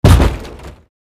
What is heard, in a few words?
Hockey Hit Boards